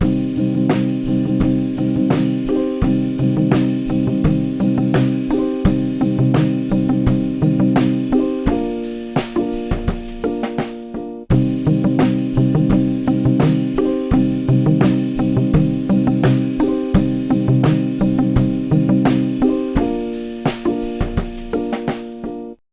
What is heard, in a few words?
Chill HH 85bpm; Tiny Midi Breakout